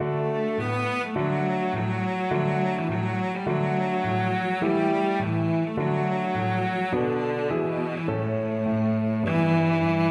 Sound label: bizet carmen toreador, cello